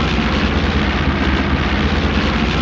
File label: air; Mafie 1